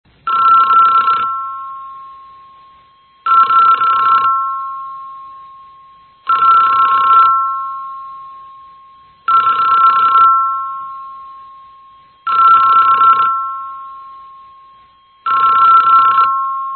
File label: tel bell